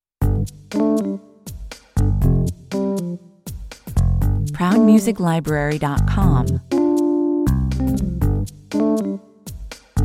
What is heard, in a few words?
sneaky 120 bpm